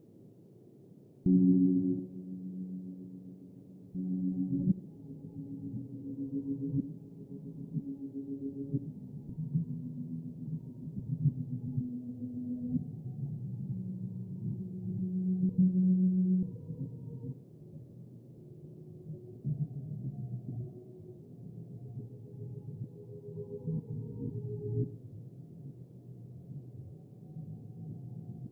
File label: Observatory Ambience